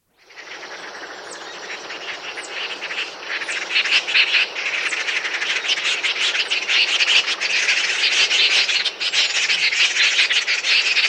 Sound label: Band-Backed Wren, Nature's Songs, S. America